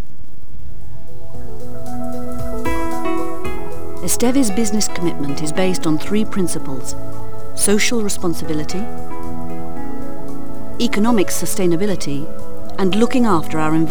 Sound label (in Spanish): corporativo corto